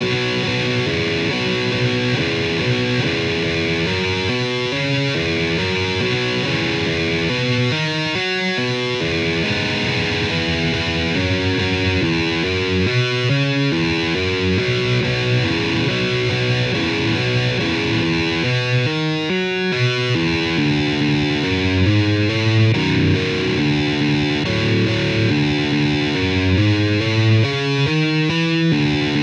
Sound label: guitar